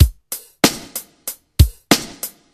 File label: 94 bpm breakbeat c sharp key